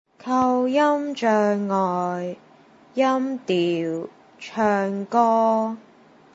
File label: pitch singing; aphasia